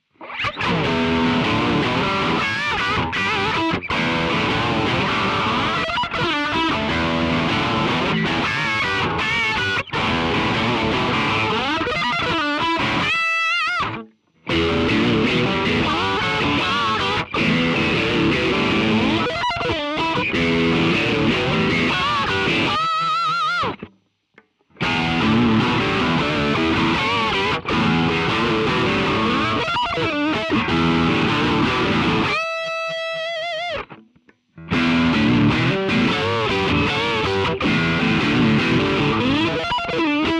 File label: Cool Rails Drive